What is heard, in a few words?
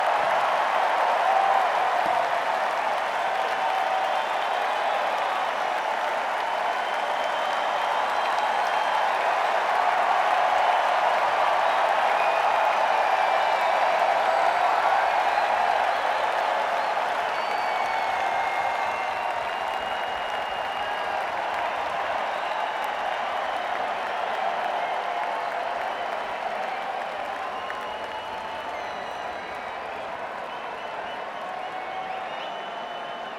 Crowd, Madison Square Garden; New York, NY